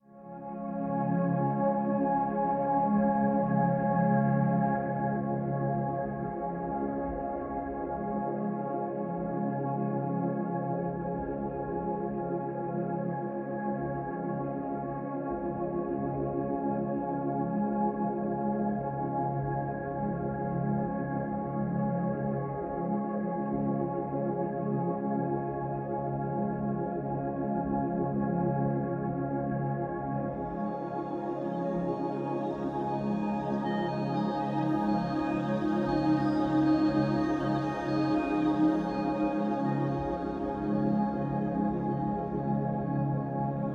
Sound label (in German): Binaurale gamma waves